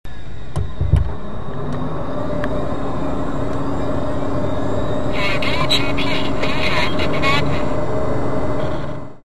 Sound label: agp error; aopen ax4pe max